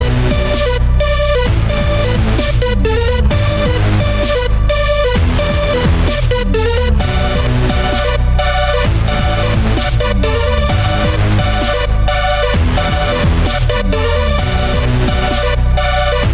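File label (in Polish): iPhone, dzwonki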